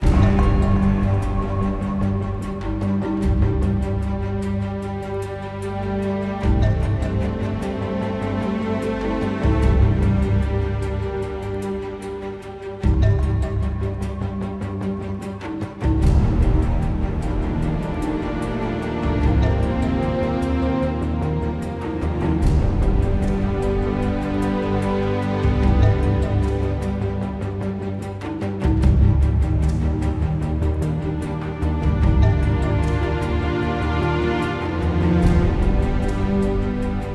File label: Music Perc, Perc Loading 75bpm; Pm Perc Loading 3 75bpm Game Mix